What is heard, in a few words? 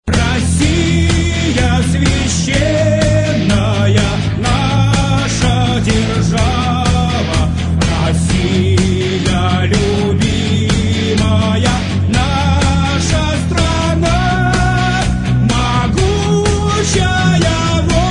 Russian Anthem